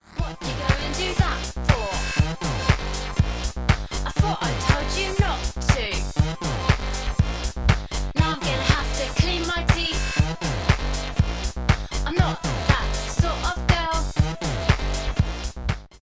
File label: Punk Disco Raw